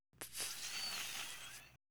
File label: Syringe Suction withdrawing Blood; Foley; Props; Foley Essentials